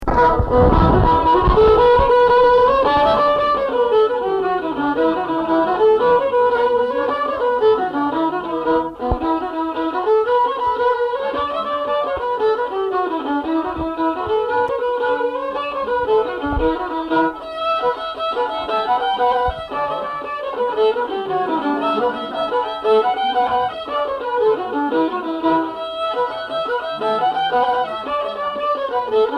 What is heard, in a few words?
jig fiddle